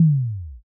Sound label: kick